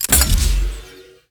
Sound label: watercannon